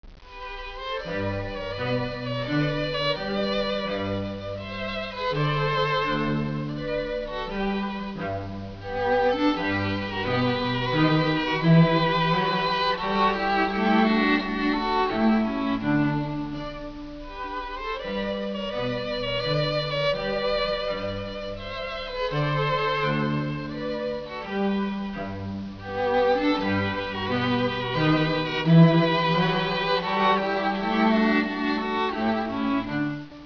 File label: minuet